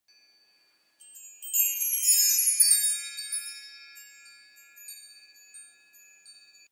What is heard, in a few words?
Wind Chimes